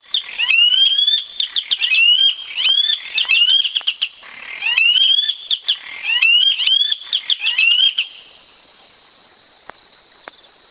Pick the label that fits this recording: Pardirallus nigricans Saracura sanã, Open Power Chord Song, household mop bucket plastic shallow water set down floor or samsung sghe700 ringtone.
Pardirallus nigricans Saracura sanã